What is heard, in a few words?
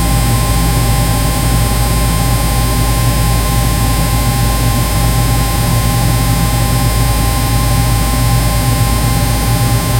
freezing by liquid nitrog, liquid